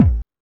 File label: Techno E, Drum Machines, EMU XL-7